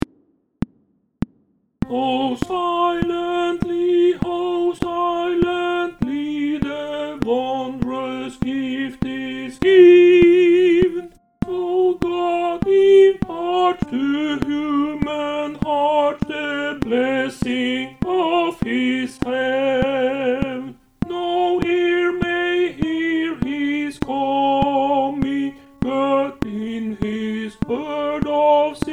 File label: Alt click